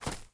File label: thug fs grass, footsteps